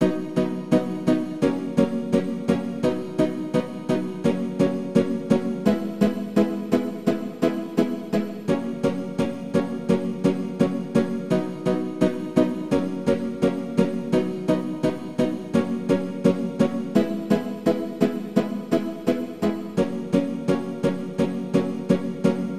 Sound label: Christmas Melodies 85bpm string marc 85 B